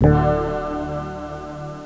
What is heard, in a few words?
KLICK